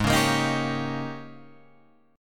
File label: Ab Major 7th Suspended 2nd Suspended 4th Ab, down, Guitar, Open F; strummed; JGuitar